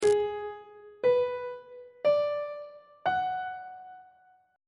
a major 7th chord 7 is g sharp half diminished 7th on treble clef